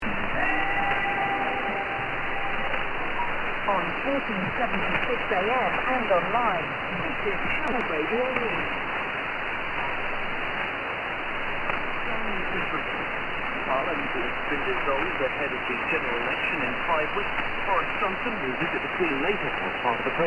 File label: Carillon Radio; Loughborough